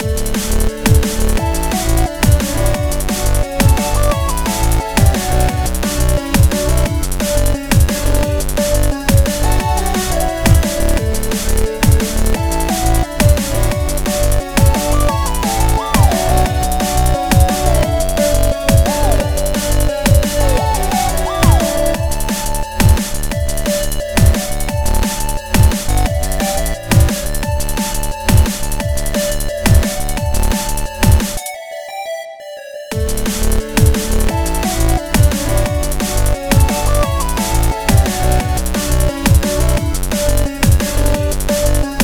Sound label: Pause Menu; Onion Rings; Friday Night Funkin' Funkin' Origins OST mod gamerip PC 2022